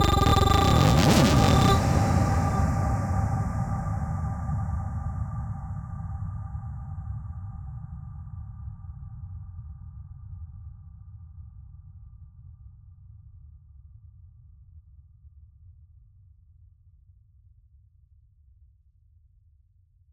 Voice Tweak G 140 BPM, psytrance; Voices; Tweaks; Ghosthack; Psytrance Visions Volume 2

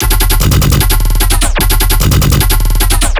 Bass Loop E, Dubstep, 150bpm; Glitchfield; Ghosthack; Dubstep Sound Arsenal

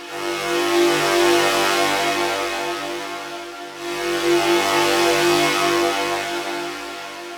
Pad Loop 130 G; Loops; Pads; Techno; GhostHack; Ghosthack - Free Techno Sounds 2024

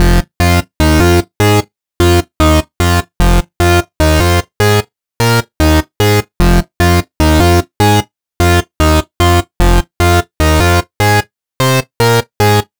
Lead Loop F Funk, Dubstep, 150bpm; Ghosthack; Dubstep Sound Arsenal

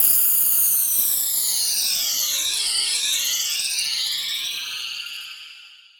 Overhanging Downlifter, Dubstep; Ghosthack - AC2024 - Day 13 - Dubstep Sounds 2025; Effects

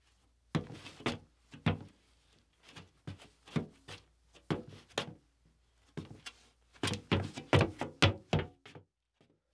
catwalk on metal tinny

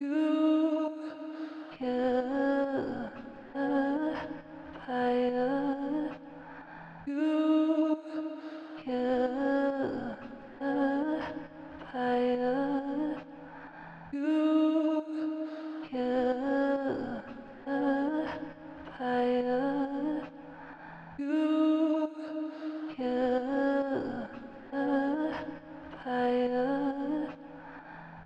136 BPM G# Min Vocals; Trap Melody Loops; Loop Stems; Cymatics; Nightmares Trap Melody Loops; Division

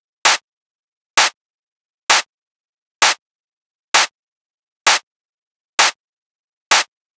Clap Loop 130, Techno; Ghosthack; Free Techno Sounds 2024

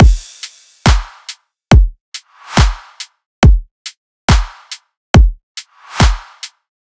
Riddim Drum Loop 140 Full

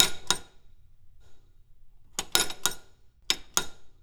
Pay Phone Coin Return Action

